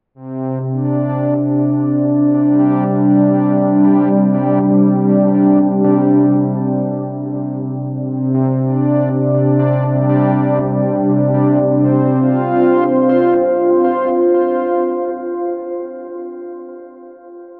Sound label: Mercury-4 Oversampling; Cherry Audio; 1 x Oversampling